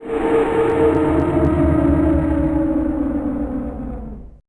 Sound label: MONSTER